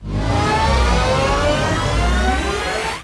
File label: Near Win; knight rider